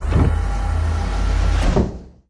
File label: Lab Door Close, sliding door